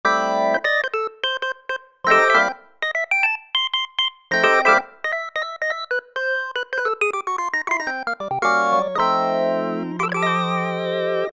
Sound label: Key 60's Organ, Alesis Micron; Factory Programs